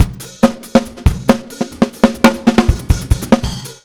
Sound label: ACOUSTIC, Big Beat Drumloops; USB Soundscan vol.25; AKAI